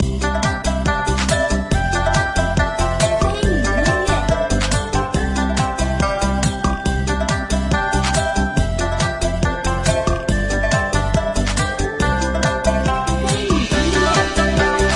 紧张-刺激-川剧

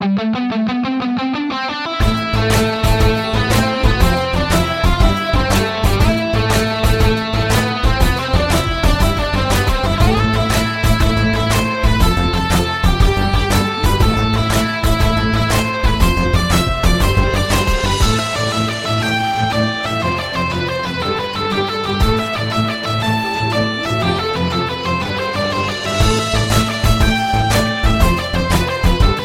主场景

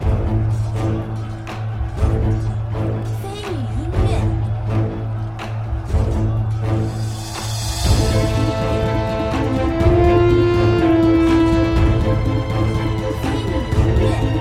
PVE战斗

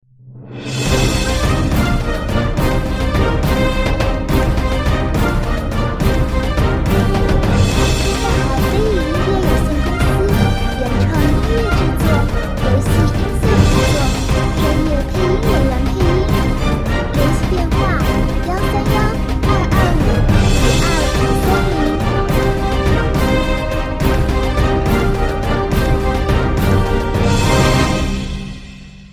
雄壮激昂登录; 我是海盗王; Login

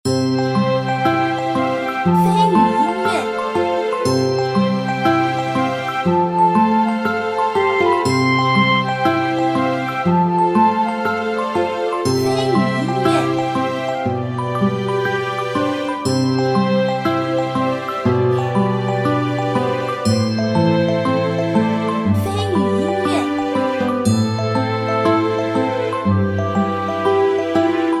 优美-舒缓-清新

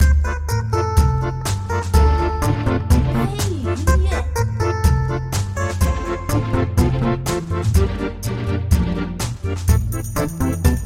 菜单背景音乐

